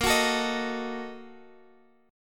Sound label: Bb Major 7th Suspended 4th Sharp 5th, Guitar, Standard; x,x,8,11,10,11; strummed